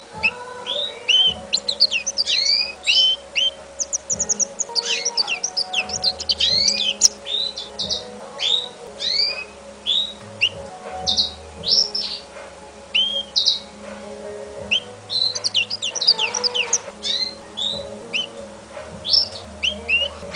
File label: african citril call